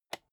rotary control single turn

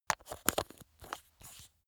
glass bottle close screw

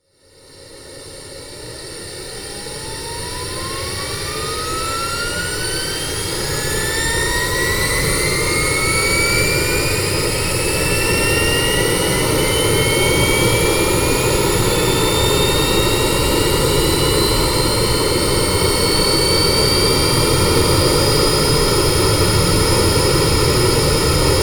plane honda private small turbine rev up

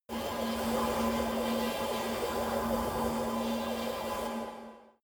space alien fabric working settle

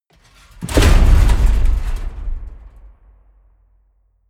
metal crash large

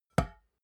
glass door small hit damped soft

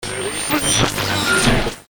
radio static noise

